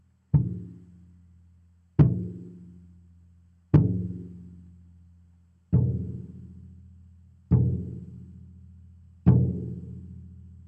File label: Big Drum